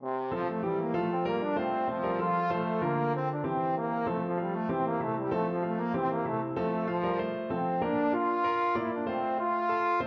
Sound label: sonata, trombone